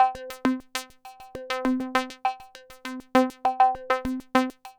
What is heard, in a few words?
synth 100 sharpsync C; Transmission-X